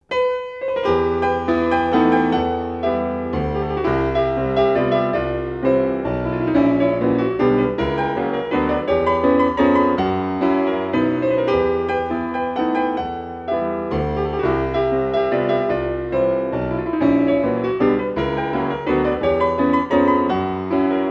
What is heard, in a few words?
Waltzes for piano four hands Poco sostenuto in E minor